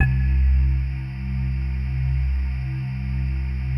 PAD, ATMO PADS; Best Service; XX Large

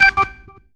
ORG RIF; E-Lab; X Static Goldmine 2; akai

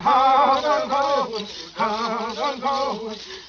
VOC

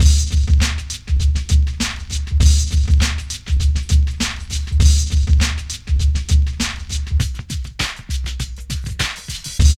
PHAT LOOP; E-Lab; X Static Goldmine 2

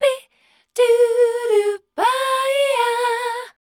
MEL C2, Voice Spectral, MELODIC; Best Service; Voice Spectral II

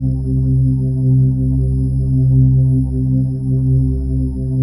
PHASE PAD, ATMO PADS; Best Service; XX Large

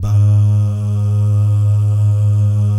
"bah" guy, Vocal Jazz Fx

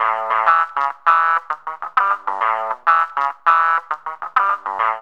GTR LOP; E-Lab; X Static Goldmine 2; akai

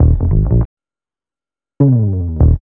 B-LOOP

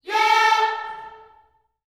"yeah" A 4, Gospel; Spectrasonics; Vocal Planet Vol.1 Gospel; akai

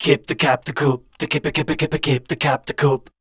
SCAT C, Voice

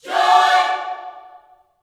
JOY CHORD, Vocal Gospel; Spectrasonics; Vocal Planet Vol.1 Gospel; akai

